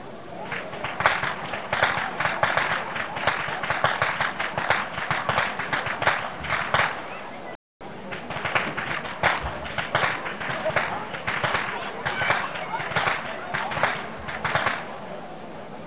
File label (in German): schnalzen, jugend; Kirchansch